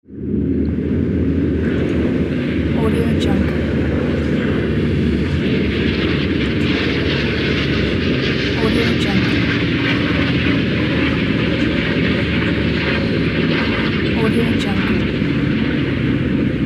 military plane; prof-s

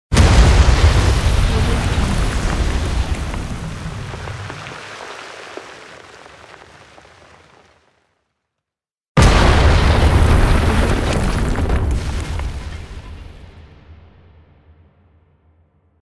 explosion

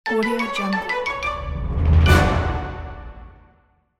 you lose game over

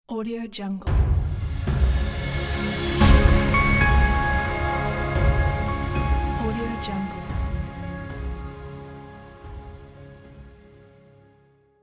inspirational logo

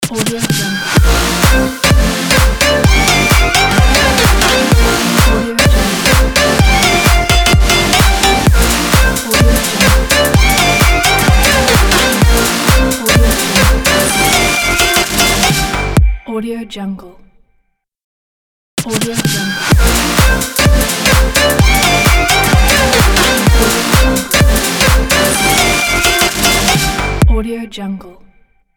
dance logo; alexiaction

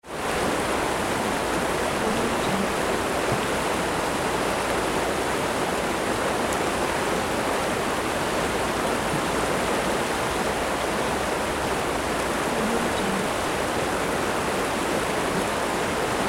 river

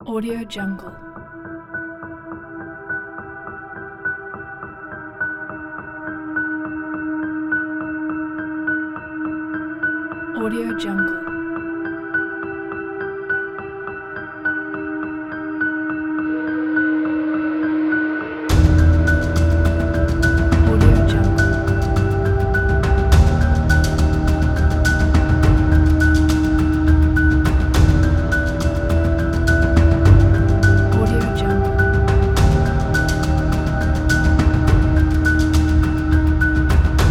tension; irminia

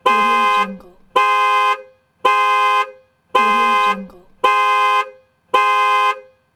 car panic alarm; bigmanjoe

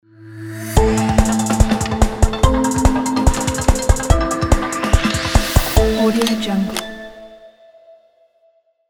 photo time lapse logo

